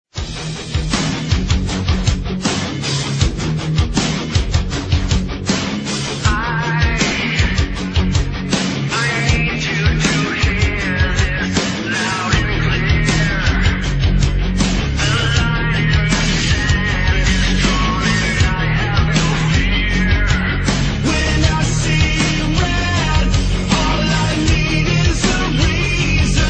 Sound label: hardcore